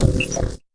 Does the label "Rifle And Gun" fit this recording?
No